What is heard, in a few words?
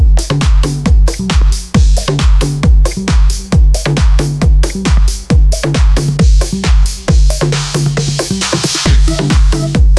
berlin 90s house; musiclm